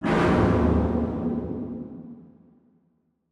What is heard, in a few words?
Demon Blast; SoundsCrate